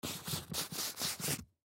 Computer Chair Leather Wipe Seat Off Multiple; Blastwave; Foley

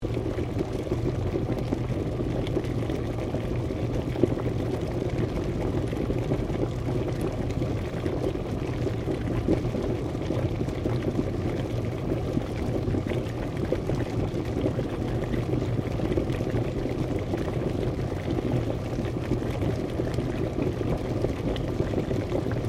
KETTLE ELECTRIC BOILING LOOP, Domestic Kettle; Kitchen; Household

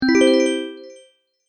Open, beep sound effects

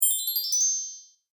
Fairy Magic Wand; Magic Sound Effects

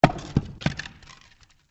Stone On Stone Large Impact; Material components for constructing more complex sounds; Drops; Objects

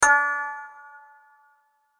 Hit Pluck Reverb; Sound Design; Blastwave

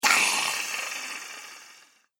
Scream Vampire, Monster Sound Effects; horror sound effects

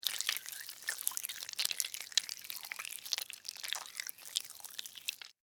Worm, Crawl, Movement, Slimy, Wet, Insect, Bug; Blastwave; Animals:Insects

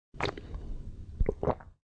Sip Swalw, Quick sip followed by a swallow.; Drinking; Sound Effects:Human

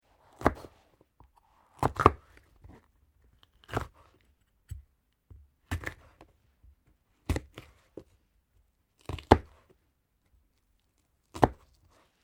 Capsicum Slice Sequence, Food preparation; Restaurants

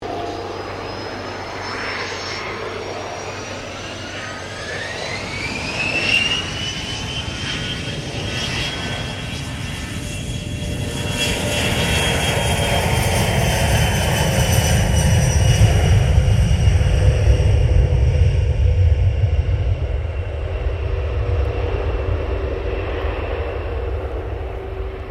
1995 A320 AIRBUS TAKEOFF; Airplanes; Vehicles

